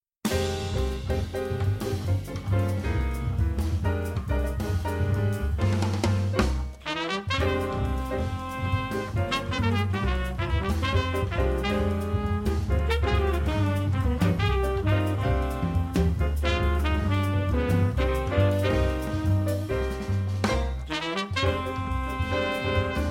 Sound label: Samba